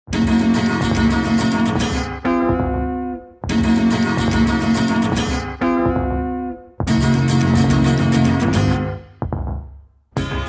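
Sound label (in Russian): sitar igral, sitar played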